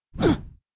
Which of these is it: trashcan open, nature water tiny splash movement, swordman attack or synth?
swordman attack